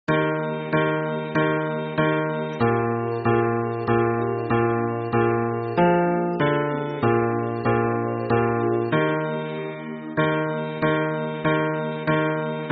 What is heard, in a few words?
hymn bass